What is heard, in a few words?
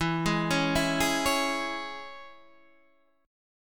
C sharp Minor E